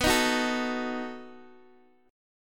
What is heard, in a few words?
B 7th B down Guitar DADGAD